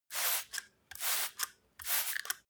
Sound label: spray bottle triple; haus garten